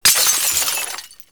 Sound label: Glass Shattering; Warframe